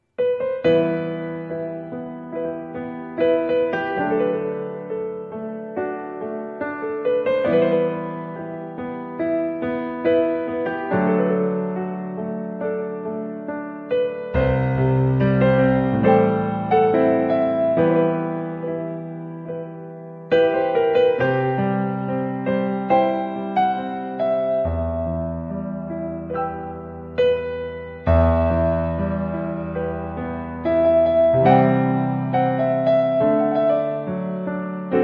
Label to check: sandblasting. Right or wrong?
Wrong